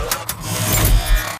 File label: Servo Jog; Titanfall 2